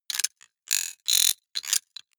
digital metal clicking, robot; comedy cartoon

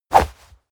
comic swoosh fast; swooshes-swishes

